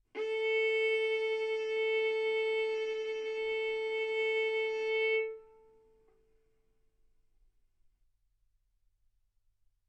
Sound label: Violin arco mf sul A A4 B4 stereo